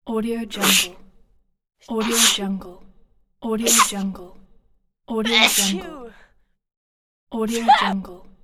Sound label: woman sneezes